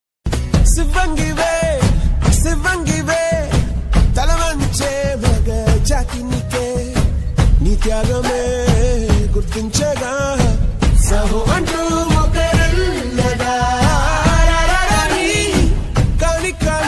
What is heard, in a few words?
ringtone